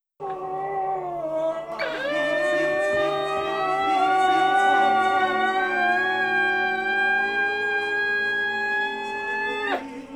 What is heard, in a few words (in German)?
Klingelbeutel